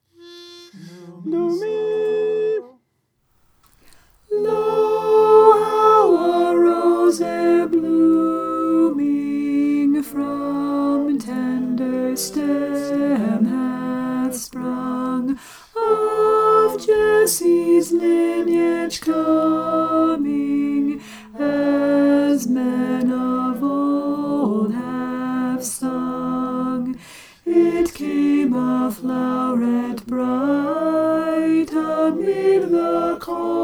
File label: alto